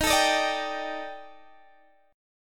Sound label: D sharp Major 7th Flat 5th, down, Guitar, DADGAD